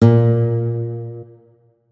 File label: notes, guitar; Hideout Furniture